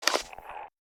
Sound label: Menu Weapon Assemble; Custom UI Sounds; Call of Duty Modern Warfare (2019)